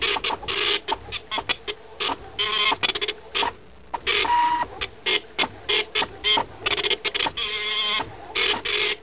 Printer

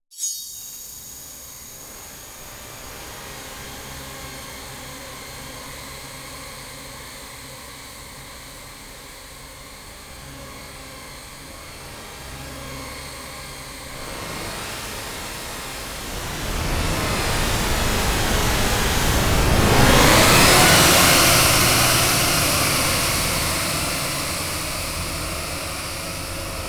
Horror Noise; Inmost

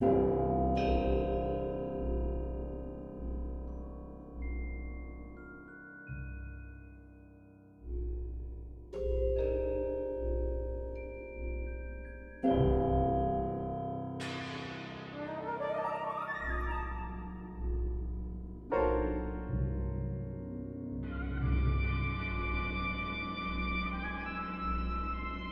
music Swamp; Adventure Craft

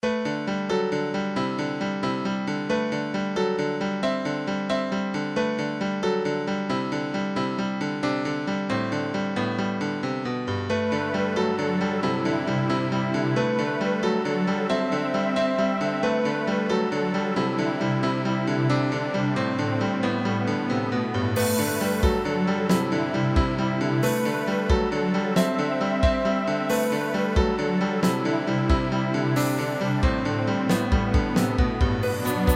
Heart; Cubicus Arcanum

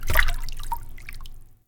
Fluid Impact Medium

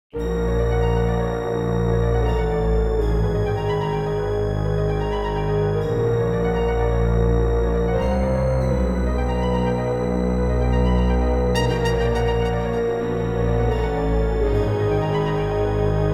Secret Passage, mt-32; quest for glory so you want to be a hero mt-32 ms-dos gamerip 1989; Secret Passage-The Minotaur-The Skull Gate

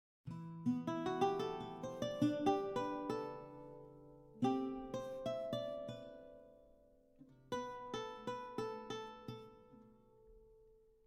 baroque guitar; gamerip

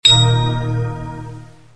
Ui Find item

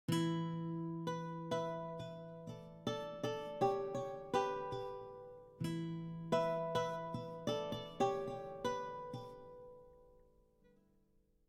baroque guitar

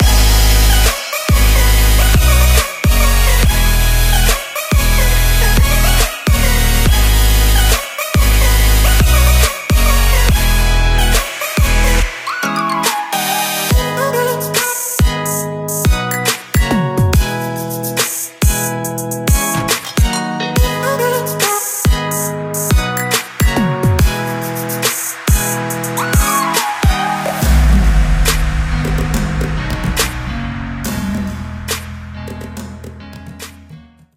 End Level; dashy square

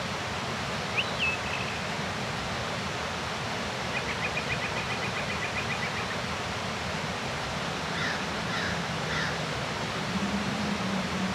Field Snow On Ground Low Birds

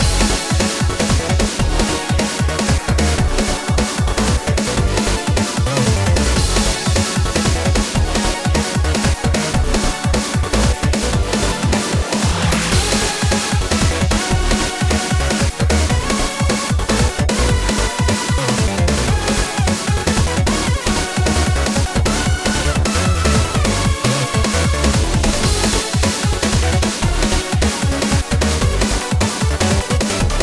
Challenge stage Loop

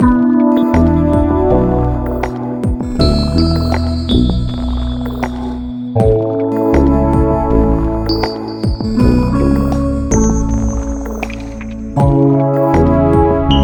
stem bridge; Pix the Cat

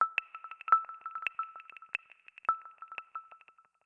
Proximity Connection; windows 11 windows gamerip 2021

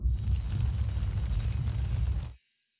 Platform Raise; Forest SFX

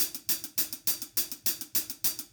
Drums; Hyperion Godmode Remix

